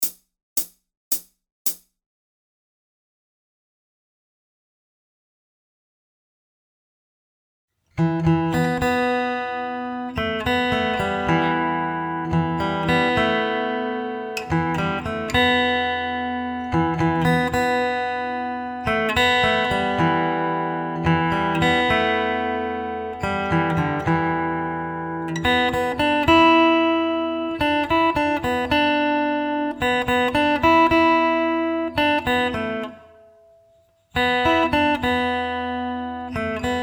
Melody, guitar; Wayfaring Stranger

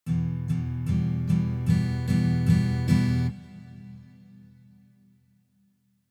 Fill Build up slow

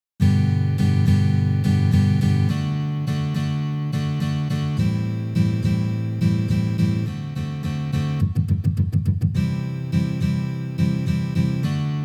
Playalong Fill

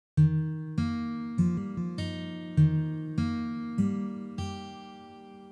Ornamentation Triplet Slow

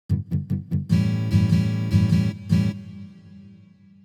Fill Funked slow

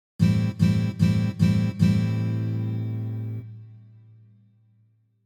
Fill Choppy slow

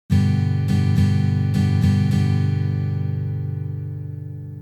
Ultimate Strum

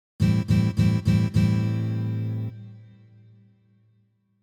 Fill Choppy

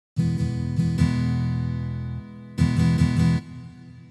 Fill Dramatic slow

